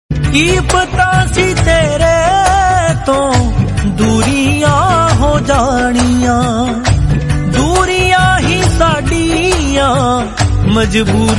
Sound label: Punjabi ringtone